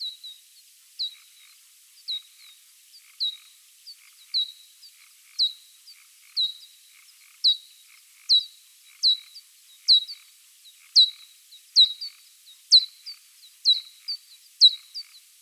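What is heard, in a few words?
Pipistrellus nathusii, bat; Boehm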